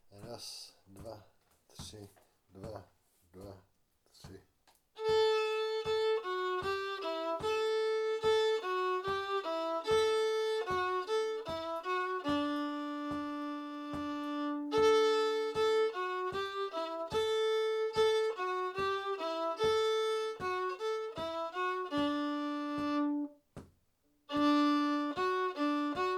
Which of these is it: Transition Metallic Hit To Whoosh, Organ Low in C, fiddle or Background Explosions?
fiddle